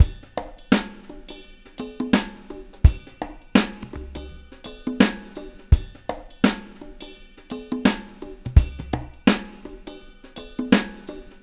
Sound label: DRUM MX; Spectrasonics; Liquid Grooves 1 & 2